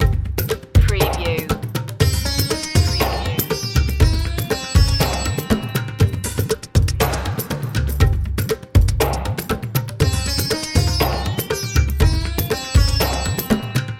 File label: techno india